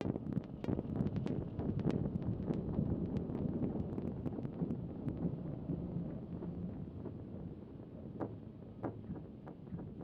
Music Bomb Room; Music Bomb Room CastleboardVersion

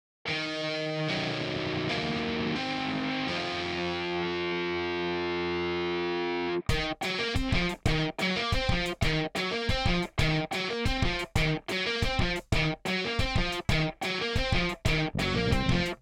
music (Factory Boss Intro); Factory Boss Ph1 Intro

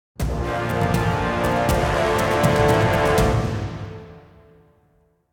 battle loss; Reigns Game of Thrones

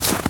Footstep Snow Run

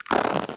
Hub-Train Strap Squeak

